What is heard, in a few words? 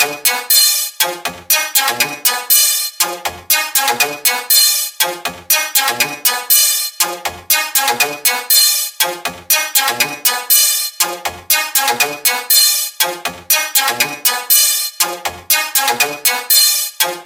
SpringyJalopy, ringtones